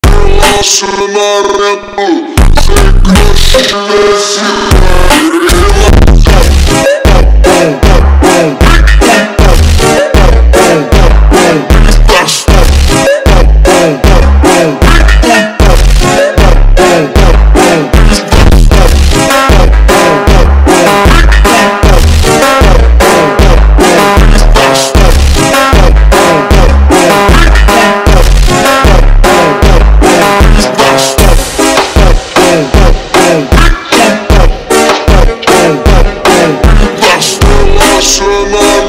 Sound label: funk slowed